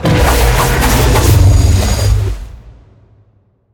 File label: spin; Smite; god Kuzenbo v2